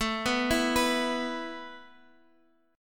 A Suspended 2nd A Guitar Drop D

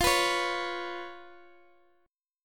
Db 7th F

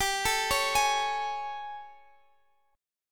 A Major 7th G

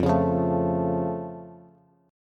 F Augmented F Bass; strummed

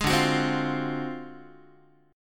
D 7th Sharp 9th Flat 5th D down Guitar; D7#9b5 strummed; JGuitar

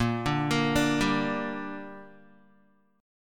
A sharp 6th, Guitar; A#6; arpeggiated

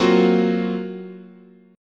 Gb Major 7th Flat 5th, chord, guitar

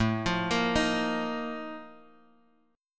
Bb Major 7th Suspended 4th, A, chord

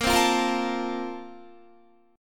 Bb Major 7th Suspended 2nd, Guitar

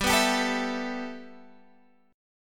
G 6th Add 9th

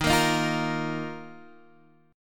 Dsharp Suspended 2nd Guitar Standard; strummed; chord; x,6,8,8,6,6; JGuitar

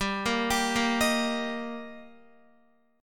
G Minor Sharp 5th G Guitar, chord; arpeggiated; JGuitar

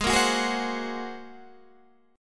Bb 7th Suspended 2nd Sharp 5th Ab

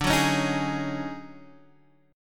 D Major 7th Flat 5th Flat 9th D, chord; DM7-5-9 Chord {X 5 4 6 4 4} strummed

